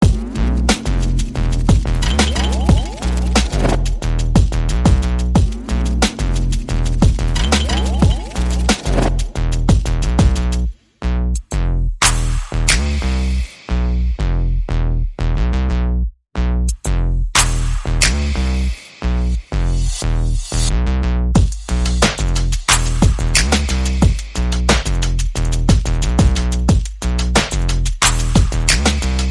Percussion Bass and Effects

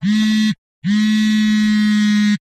Pager Buzzer

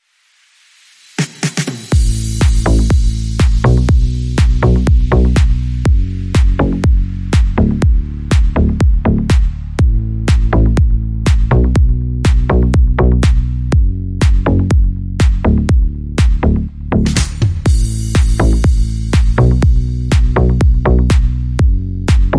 Drums Bass and SFX Only

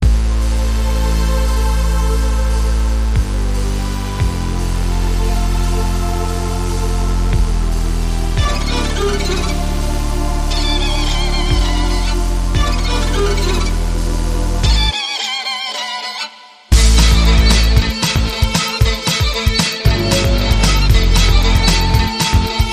Devils Violin

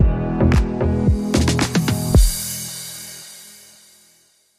Bumper

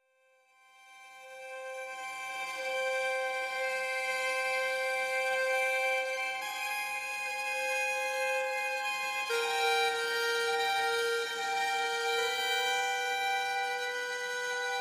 Harmonics Only Mix

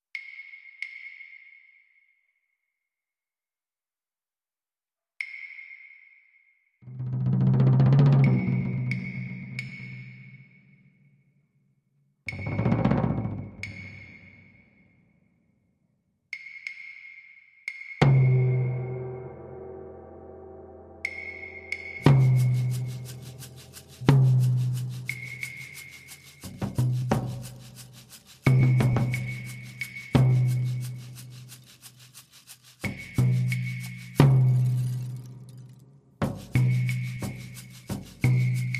Barking Bells

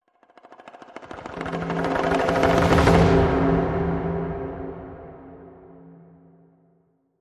Hushed Ethnic Perc Cres

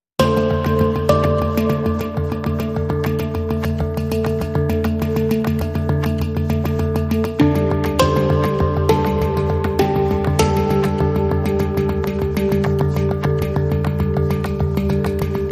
No Bass

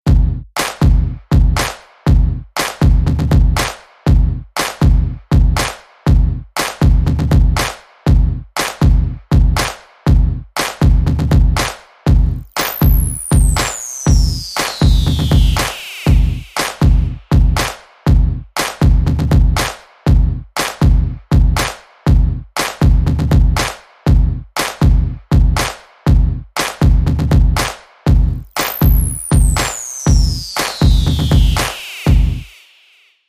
Madness Beat

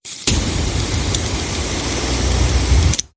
Flamethrower; Stale Air

